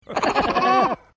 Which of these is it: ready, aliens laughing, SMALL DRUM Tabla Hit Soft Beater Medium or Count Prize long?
aliens laughing